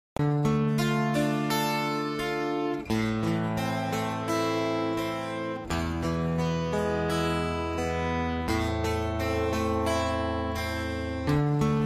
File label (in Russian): блатная, гитара